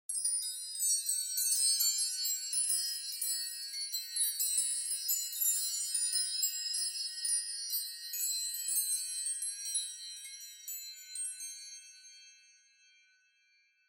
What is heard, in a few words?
Onoma Sparkle Mid; オノマトペ キラキラ